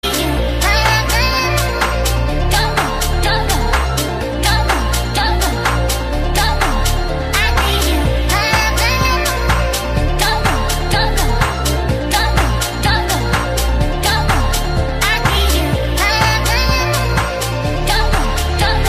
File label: electro